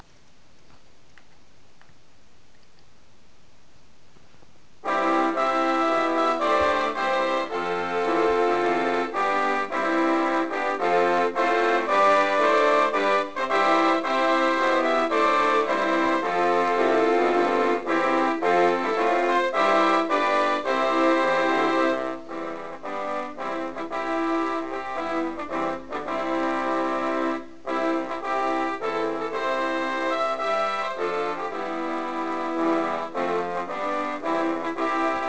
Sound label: Radio Ethiopia National Anthem on 7 MHz